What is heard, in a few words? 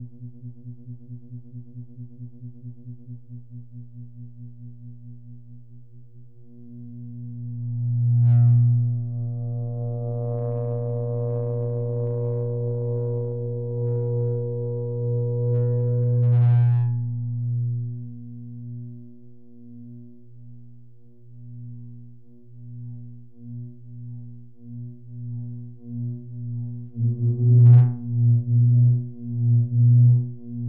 hum